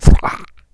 fall; player

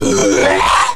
death

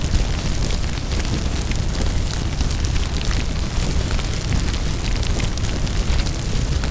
fire

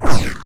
pain; player

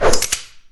weapons change

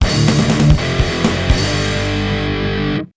flag capture your team

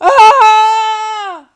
falling; arachna

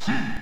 jump

